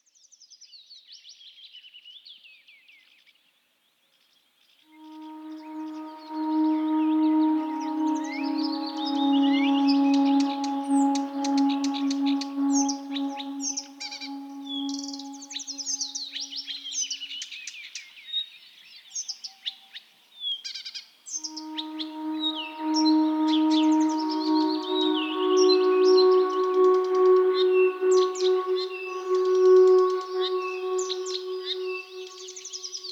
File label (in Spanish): Sonidos de Aves; New Age